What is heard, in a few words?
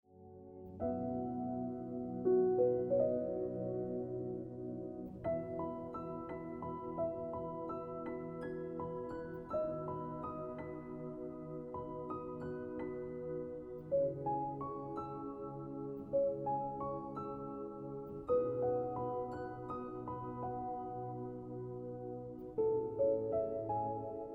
Looking Back Ringtone Piano Music